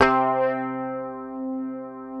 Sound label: PICKED, Bass, C3; Sampleheads; Will Lee Bass Library